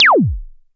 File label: korg trident